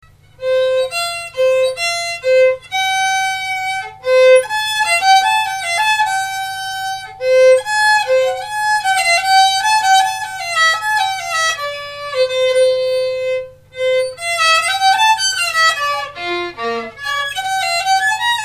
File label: gavotte